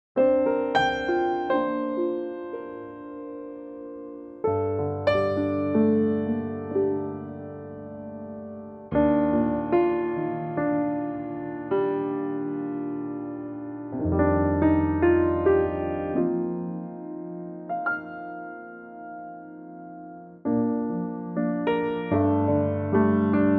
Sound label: Bb Piano; key - Bb; vocal range - D to Gb; Really gorgeous piano only arrangement of this old classy gem